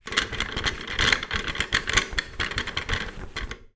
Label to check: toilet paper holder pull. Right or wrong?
Right